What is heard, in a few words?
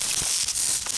acid spray damage; dota; alchemist